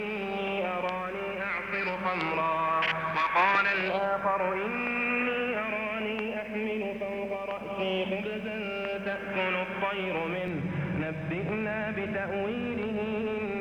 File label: 17615 khz - AM; Radio Riyadh